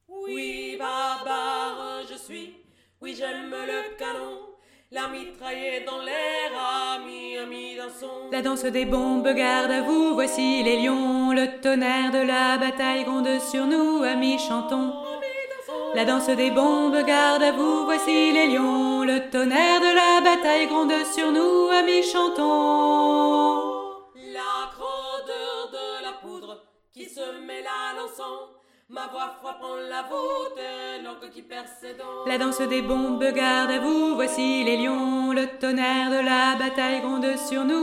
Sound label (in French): LA DANSE DES BOMBES VOIX DU MILIEU; chants de lutte; Composée en 1871 par Louise Michel, militante anarchiste aux idées féministes